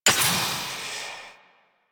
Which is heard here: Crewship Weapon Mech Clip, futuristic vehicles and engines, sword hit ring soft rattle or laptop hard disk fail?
Crewship Weapon Mech Clip